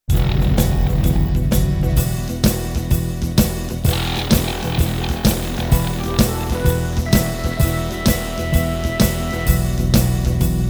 Rock And Roll Instrumental

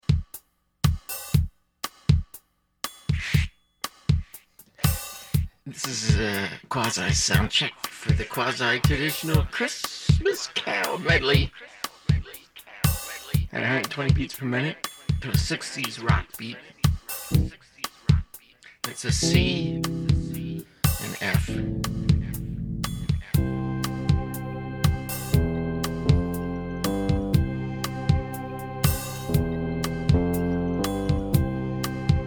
Quasi Traditional Christmas Medley Soundcheck

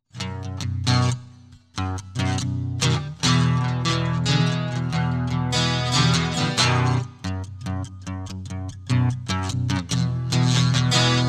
Guitar Loop

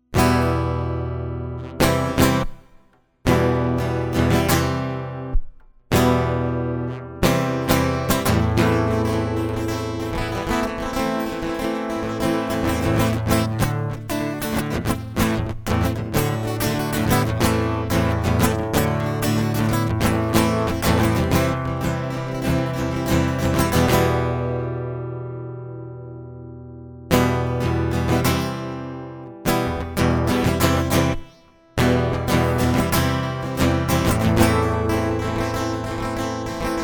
acoustic

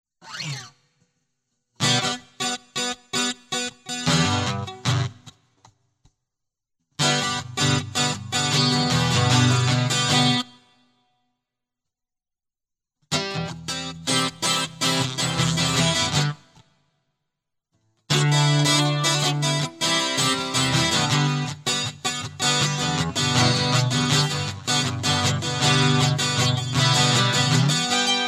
Ska Blues Intro

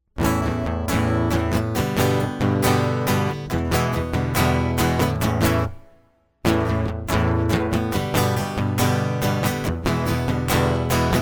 acoustic

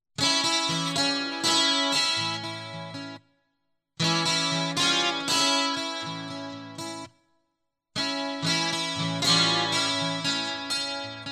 Guitar Loop

